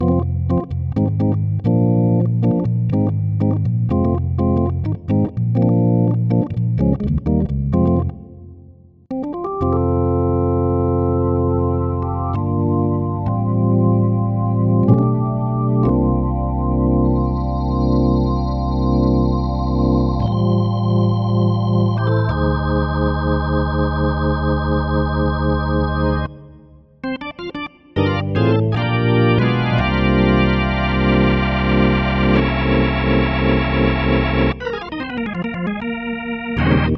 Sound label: Organ